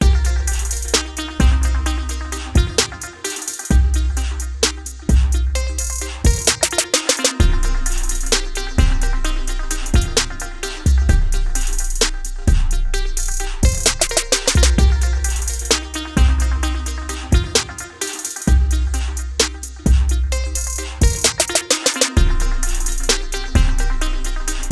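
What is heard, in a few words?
electronic synthesizer vlog short video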